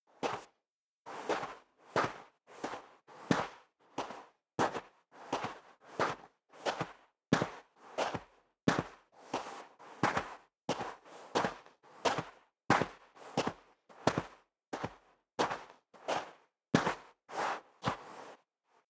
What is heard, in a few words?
step sneaker sand walk sequence